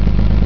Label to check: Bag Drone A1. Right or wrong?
Wrong